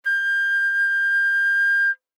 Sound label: Flute nonvib ff G6 stereo; Woodwinds